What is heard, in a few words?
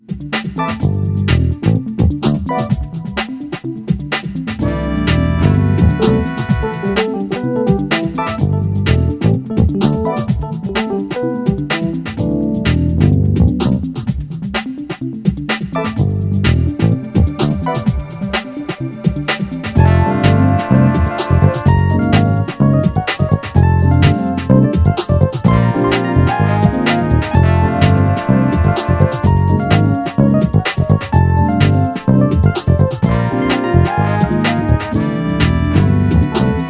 jazzy